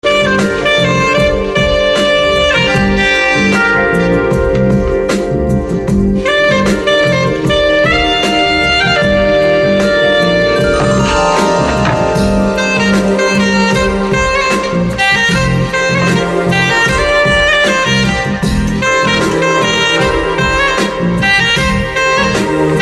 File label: Saxa